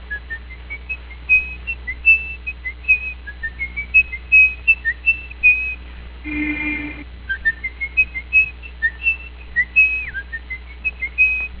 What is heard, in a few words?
whistle; lorrelibrary